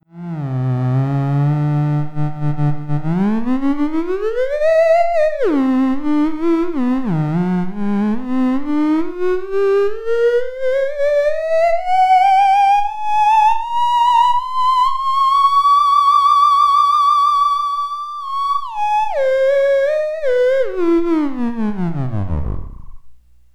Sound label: vol-off-attack, theremin